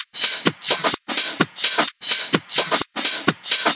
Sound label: House Loop, 128 BPM; Vengeance Samples